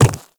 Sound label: Quadruped Medium Walk Default, Footsteps